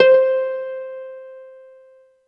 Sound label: Kawai K5000W Harp C5